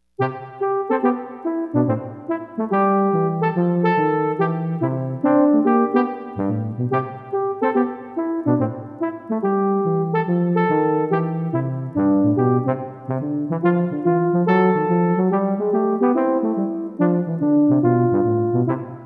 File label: Up On A Housetop 2 Tb